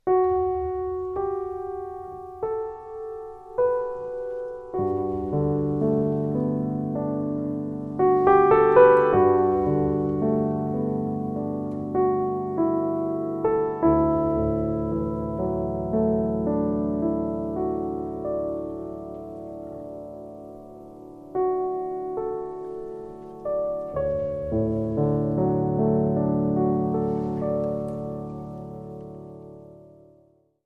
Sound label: Piano Solo